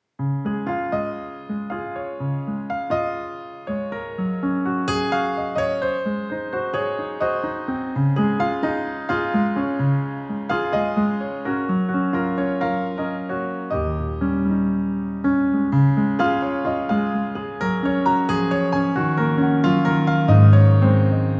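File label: Acoustic Piano Cover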